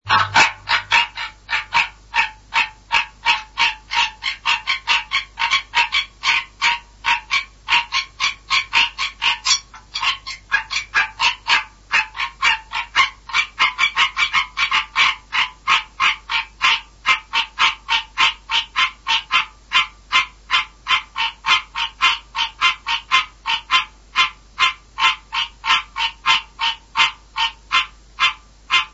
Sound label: hyla, grenouilles; hyla conc print